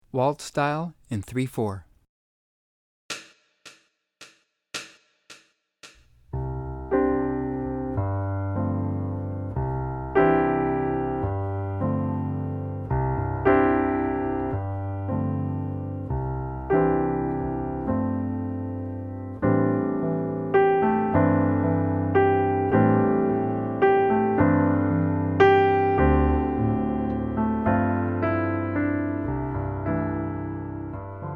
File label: waltz